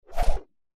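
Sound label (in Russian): еще взмах; Звук мечей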